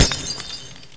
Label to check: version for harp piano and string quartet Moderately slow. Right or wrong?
Wrong